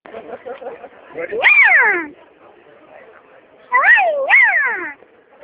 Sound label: kawaii nya